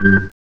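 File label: organ tone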